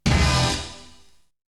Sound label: Hit; ZERO G - Datafile DISC 1